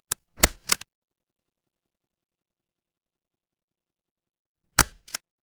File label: office stapler